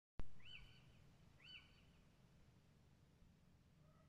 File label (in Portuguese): som de mocho-galego